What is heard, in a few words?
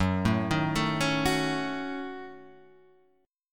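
Gb Minor 6th Gb, 8 string; arpeggiated; JGuitar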